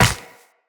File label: Run Ice, Footsteps; Tenno